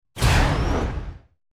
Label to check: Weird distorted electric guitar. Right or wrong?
Wrong